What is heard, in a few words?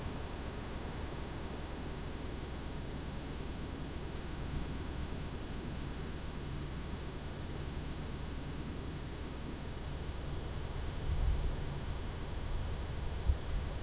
the sound of a flower, field